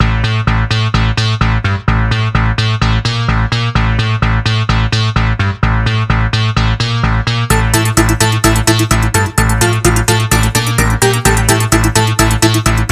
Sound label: 80th Electro